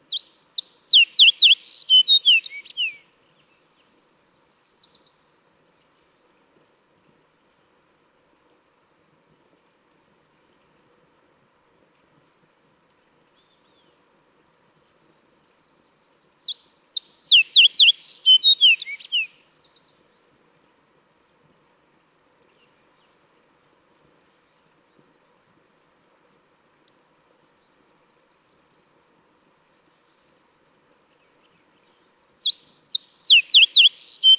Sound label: Fox Sparrow